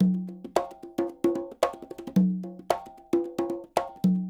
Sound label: SHUF, Percussionworks; Sampleheads; NYC Percussionworks